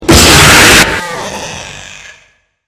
jumpscare; springtrap

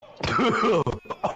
vomit

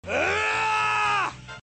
muscle scream; roblox

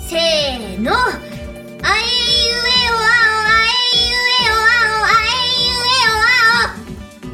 vocal exercise

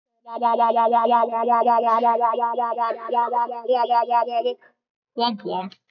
wooomp wooooomp wooomp

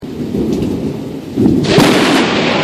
kaboom thunder

